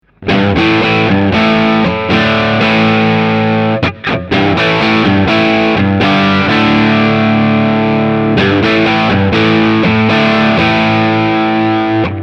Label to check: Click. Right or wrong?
Wrong